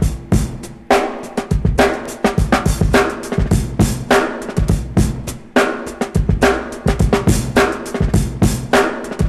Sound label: 103 bpm fresh drum groove g sharp key